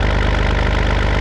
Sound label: Ferrari Enzo Idle